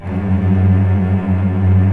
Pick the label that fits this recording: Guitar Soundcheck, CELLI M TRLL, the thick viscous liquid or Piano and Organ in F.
CELLI M TRLL